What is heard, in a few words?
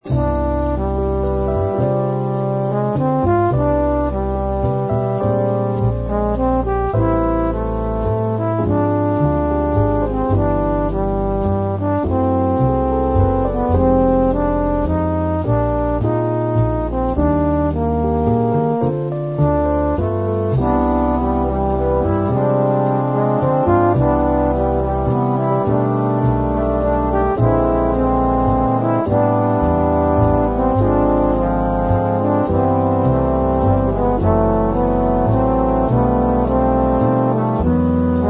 trombones